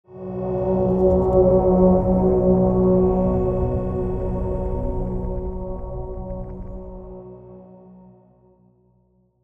Tone

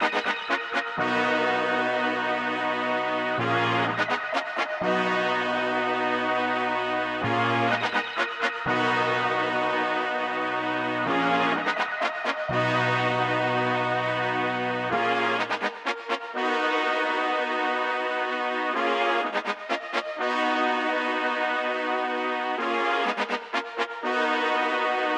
125 BPM B Maj, Trap Melody Loops; Quarter Back; Cymatics; Nightmares Trap Melody Loops

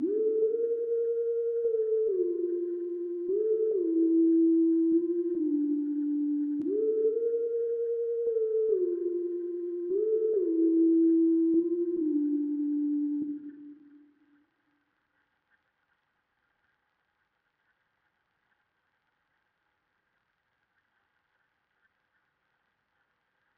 145 BPM D Min Lead Synth, Loop Stems; Melodies; Cymatics; 2022 Melody Mystery Expansion; Hidden Hills

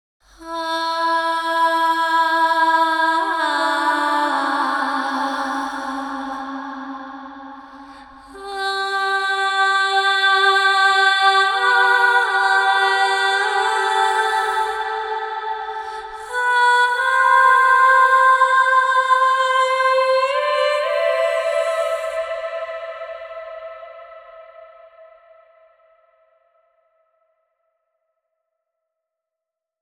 Intro & Outro Vocals - Fm 60Bpm (WET); Construction Kits; Ghosthack; SkyRealm; Kit 04 - High Rock View

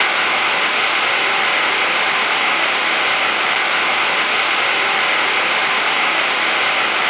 Texture Loop, Techno

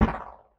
Alien Weapon Shot; Game Weapons; Military; Game Essentials

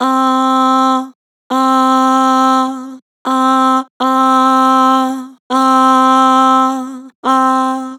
Vibrato "Aah", Vocals; Harmor Vocals

